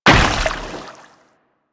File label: Moa Combined Step Water, footsteps; Enemies; Warframe